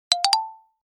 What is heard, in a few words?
new message chime